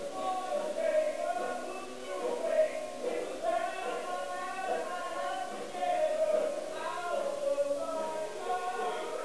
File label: c bells